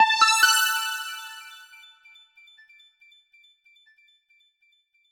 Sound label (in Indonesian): Nada Dering Nokia Jadul; Entice